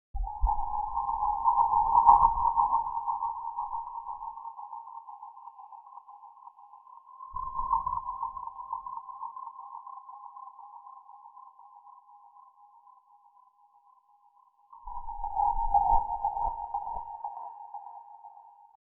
haptic calm relaxing smartphone

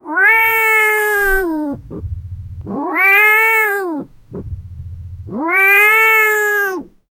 a cartoon style male cat; animation

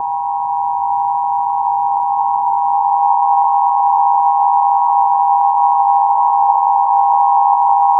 fpv drone sound

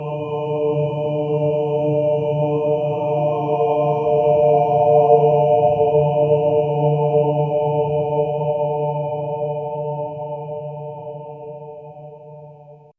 a soft choral hum, angelic

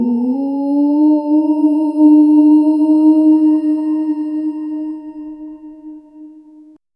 a peaceful hum as soul, ethereal

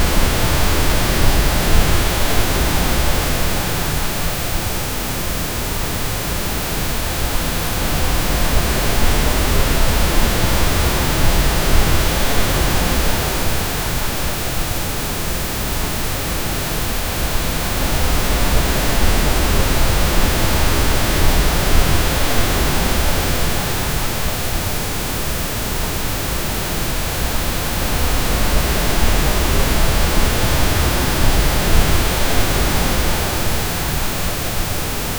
long seamless pink noise

